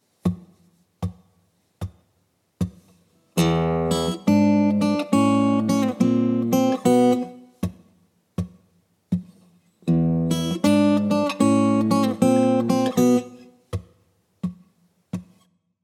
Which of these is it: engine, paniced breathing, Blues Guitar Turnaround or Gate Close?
Blues Guitar Turnaround